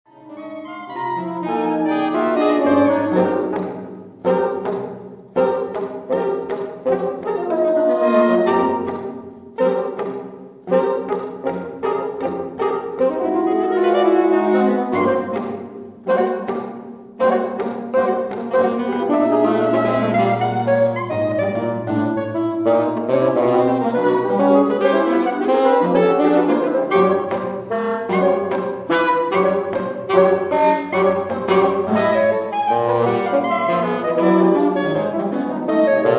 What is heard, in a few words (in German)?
saxophonquartett